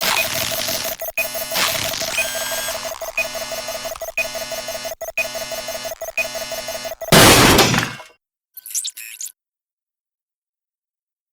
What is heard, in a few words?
breakdown